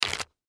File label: Zip Lock Bag Open Fast; Plastic; Materials; Household; Blastwave